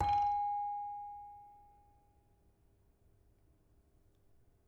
Saron wrong, gamelan; gravityharps; timbrebox

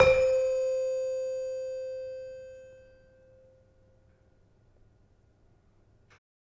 Demung hard, gamelan

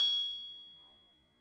Panerus 7 C Sharp, gamelan tuned; gravityharps

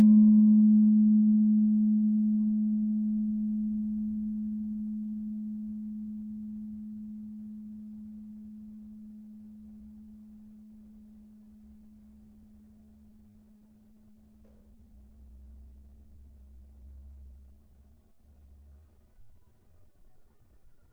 Slentem 3 A, gravity harps tuned

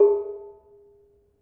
Bonang Barung soft, gamelan

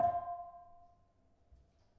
Bonang Panerus, gamelan

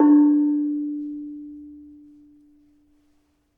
Barung 4D, gamelan tuned; gravityharps

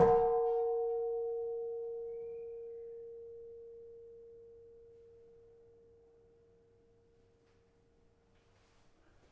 Kenong, gamelan

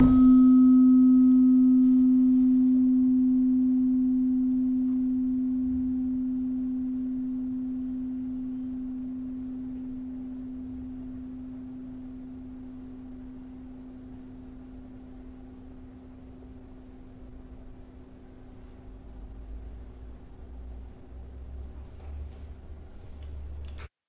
Slentem 4 C, gravity harps tuned

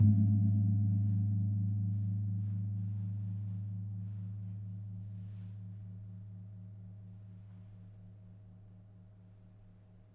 Kempul, gamelan

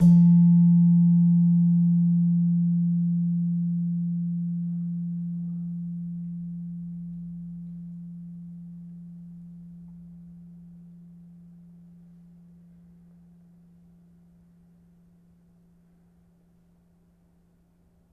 Slentem, gamelan

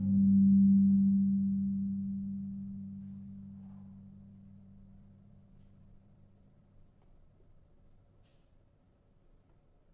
Gong 3 G Sharp, gamelan tuned; gravityharps

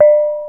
reyong mid, gamelan; gravityharps

